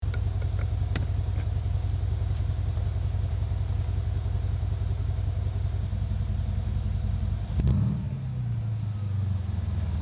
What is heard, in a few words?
e500 coupe